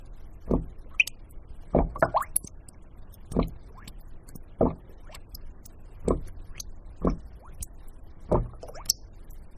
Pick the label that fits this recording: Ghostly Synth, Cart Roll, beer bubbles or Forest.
beer bubbles